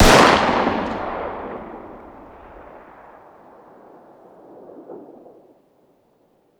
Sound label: fire dist 9x19 pistol ext; gmod; arccw uc commons attachments